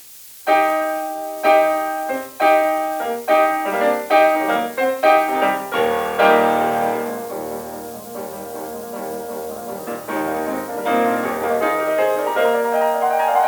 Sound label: Rachmaninoff Etude Tableau